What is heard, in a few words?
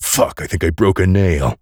Pain; Duke Nukem; Forever 2001